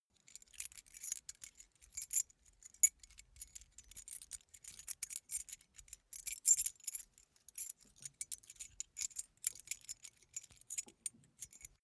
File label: key ring small rattle sequence